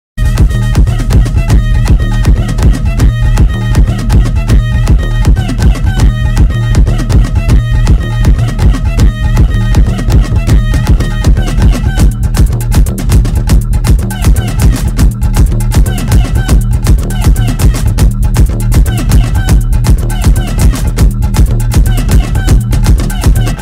climax fight bgm ringtone tamil bgm; maaveeran; sk

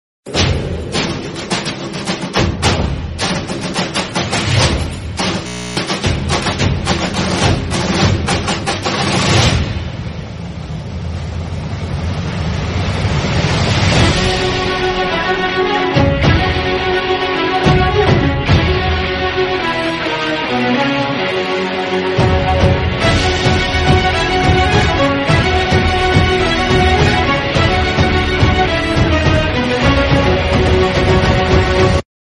theme music bgm ringtone; sultan; salman khan

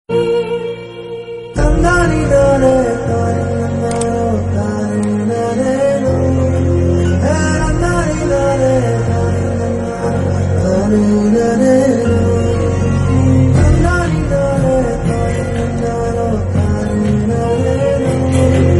Bgm Telugu Sentiment; Father's Day

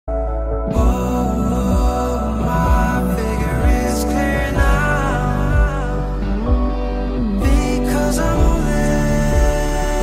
ringtone korean series; my name

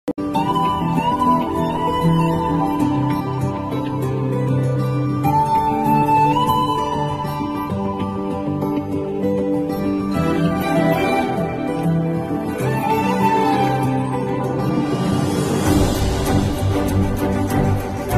telugu ringtone; rowdy boys

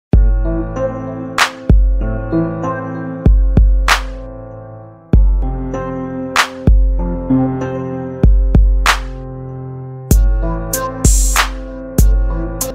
ringtone ringing tone

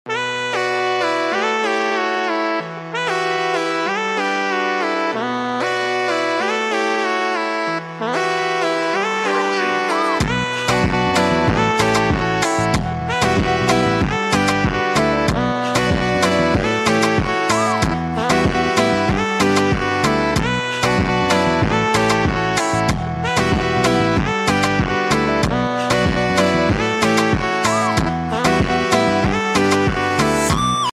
Kompa Bgm

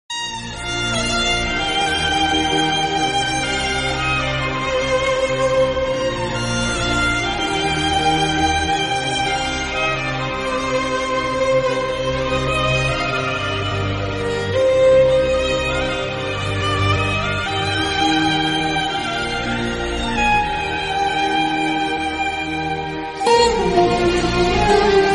sad climax bgm instrumental ringtone; raja rani

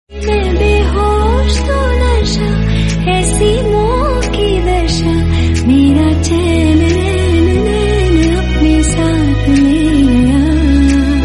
Hindi Love Song; Radha Krishna; Padmaavat

